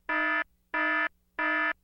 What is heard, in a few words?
warning alarm